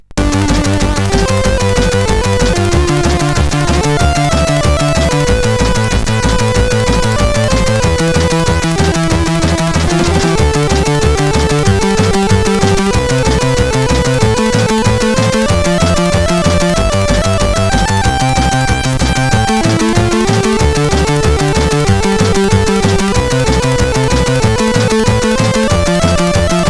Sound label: Splash Wave, CSG8580R5